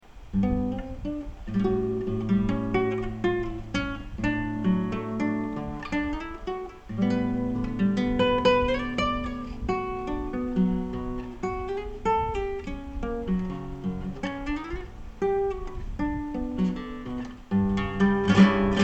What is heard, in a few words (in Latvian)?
pieminas melodija